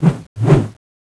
dragonsword draw; cstrike; bb weapons

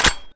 mg42 clip out; cstrike; jb engine; weapons

